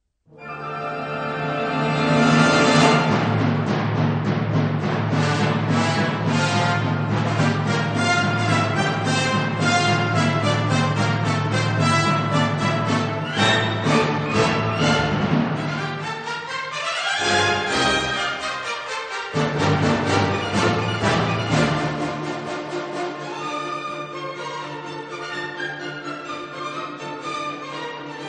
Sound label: Symphony No. 5 in D Minor, Op. 47 4th movement, Allegro non troppo; Shostakovitch